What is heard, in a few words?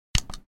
Button